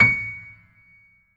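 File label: C#7, grand piano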